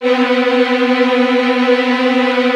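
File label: Virtuoso Strings, violin trills, B1; ILIO; Kirk Hunter Virtuoso Strings VOL-1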